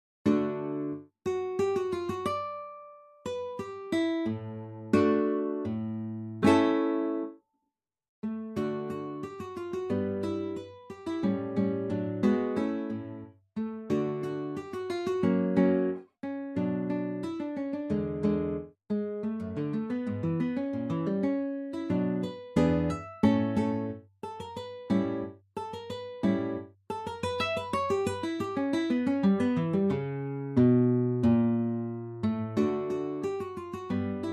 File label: humoreske